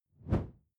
whoosh simple short low; swooshes-swishes

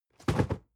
cardboard box medium fall low; objekte

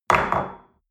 espresso place on table hard

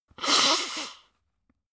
nose blow; soundpool

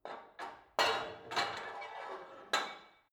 put weight onto barbell unsteady

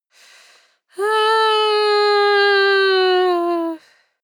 female moan whine soft; koerpergeraeusche; menschen; soundpool

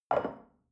shell upside down place on table soft short; berufe; gastronomie; soundpool

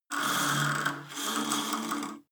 cable machine old pull release fast; sport freizeit

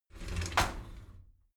shower slide door inside open regular halfway

